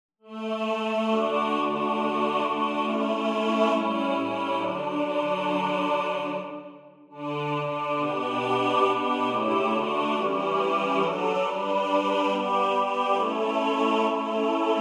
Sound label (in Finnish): Kiteen Hurskaisten sukulaulu; sukuseuran laulu